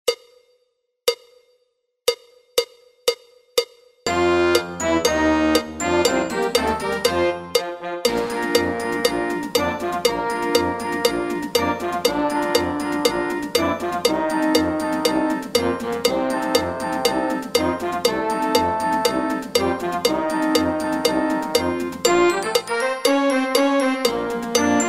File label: Fight Song 120 NO CLICKS